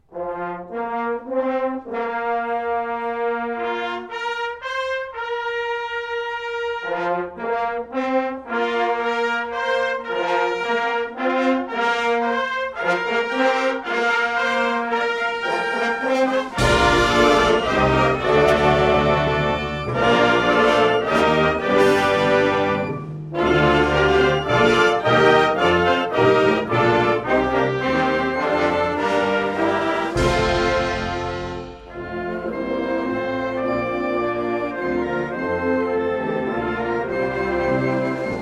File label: Flourish for Wind Band